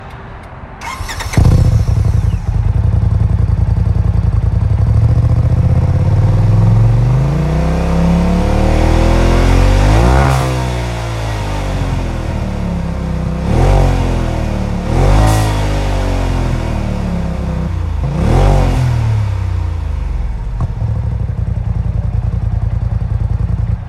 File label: Polaris RZR 3 Untamed Turbo Back High Flow Exhaust System with Resonator